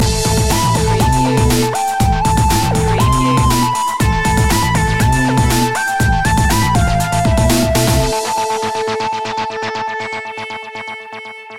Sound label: unnatural science loop ending